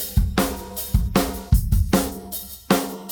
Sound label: drum loop 4 bar 4-4, 154